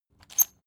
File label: salt mill squeak hard